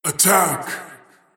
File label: attack pain; Void Spirit